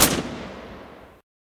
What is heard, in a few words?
kac loop tail; Escape from Tarkov